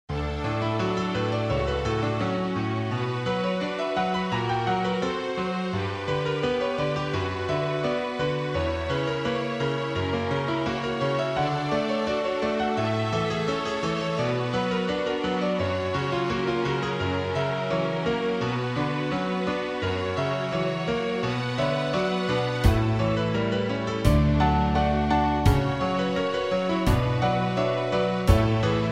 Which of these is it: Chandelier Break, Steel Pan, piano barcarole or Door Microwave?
piano barcarole